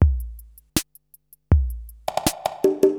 DRUM LOOP ambient 160

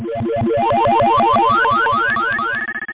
beam; JOUST40

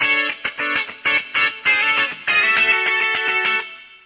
funk bit

